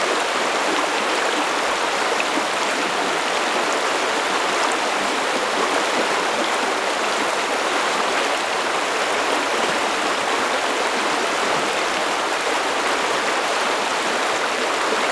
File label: ambience water